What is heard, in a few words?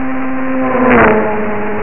motorcycle; renegade